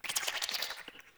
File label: Root Cave Creature Close; Ambience; One Offs; Neathuns Root Cave